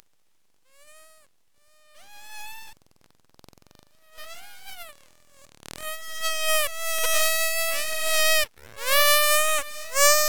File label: Heavisides dolphin communication burst pulse; Namibian Dolphin Project; Frants Jensen